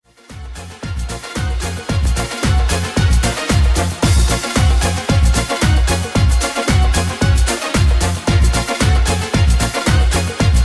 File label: Fanfare Beat